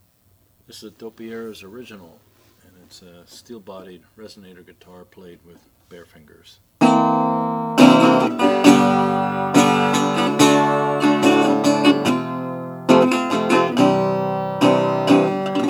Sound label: doperas original model 30 gold